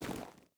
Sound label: footstep boots gravel walk; Rust